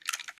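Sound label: gear rattle weapon small; viper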